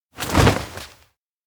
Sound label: land; aino; anemoi